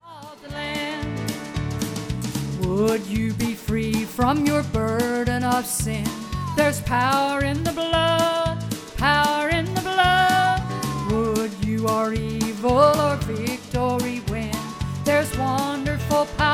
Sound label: Hymn Medley